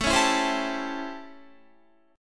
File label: A 7th Sharp 9th A, Guitar